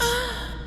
moan; Sonic 06

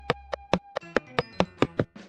footsteps

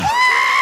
scream

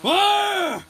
Fire

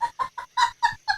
laugh track; Snapcube Sonic Adventure

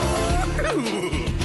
laugh

